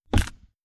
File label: Stick